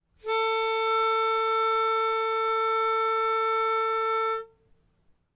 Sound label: Guitar Pitch Pipe A3